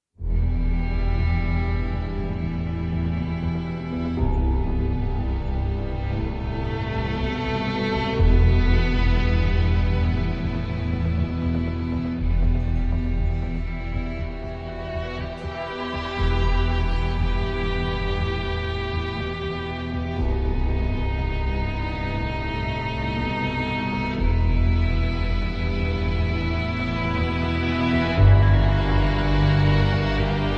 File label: Seriousness Ambient